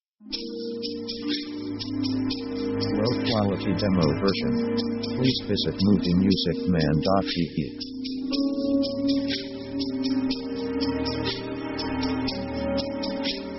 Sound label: orc spooky; orchestra themes